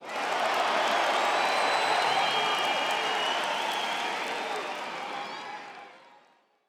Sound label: cheer kill